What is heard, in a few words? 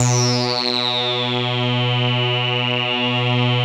SPLIT SWP B3